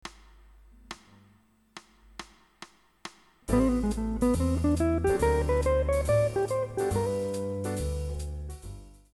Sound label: ii V I Licks